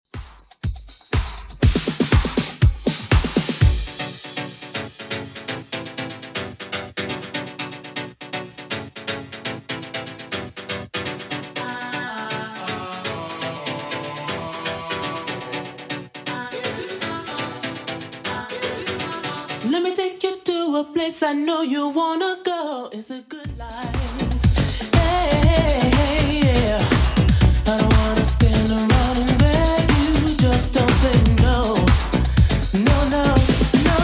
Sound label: 80s Redrum 121bpm